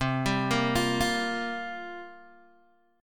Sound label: C 7th Suspended 4th, Guitar, Open G